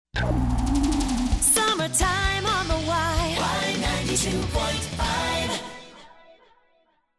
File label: jingle summer